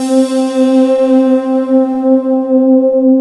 ORG VOX; Rubber Chicken Software Sample Bank

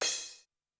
CRSH; CasioPop

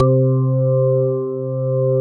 ORGAN

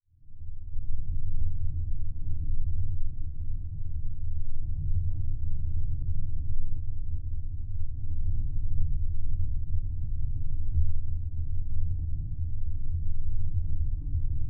Geofon, Drone, Dark, Spooky; underground sounds

